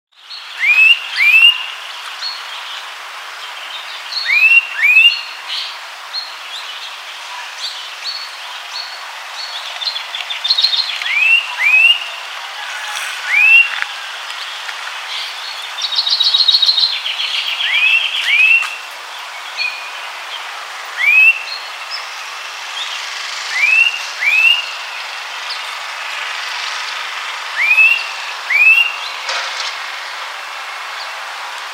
Myiagra Inquieta; Restless Flycatcher; Call

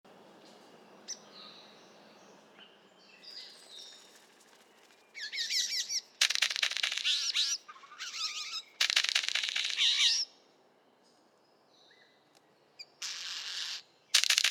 Prionodura Newtoniana; Golden Bowerbird; Call

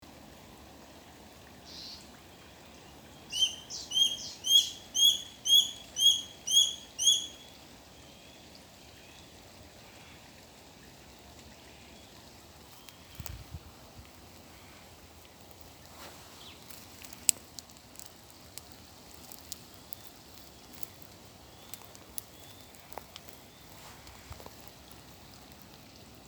Tachyspiza Novaehollandiae; Grey Goshawk; Call

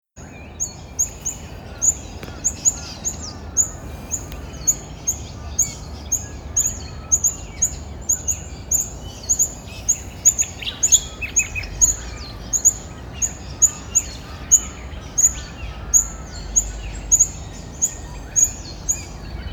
Rhipidura Rufifrons, bird species; Australian Rufous Fantail

